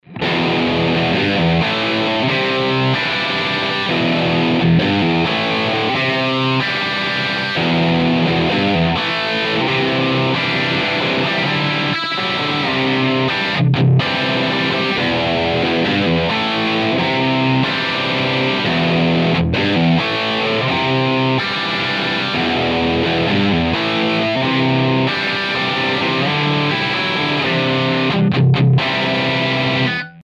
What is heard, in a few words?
JCM800 4x12; podxt